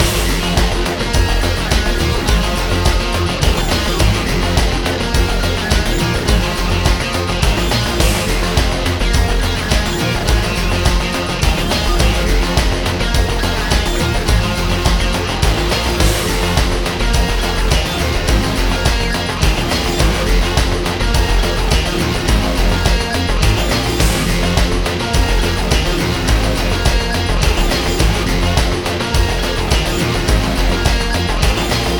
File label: BGM Kowloon Park